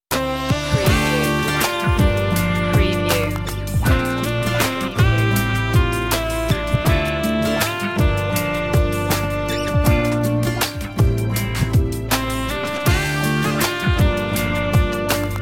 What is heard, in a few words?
soothing soul